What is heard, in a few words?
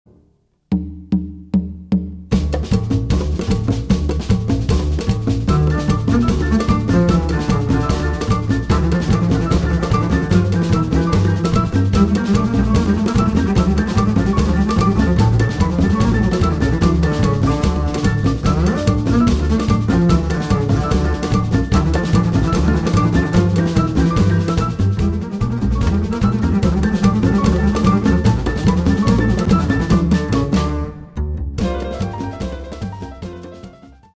Czardas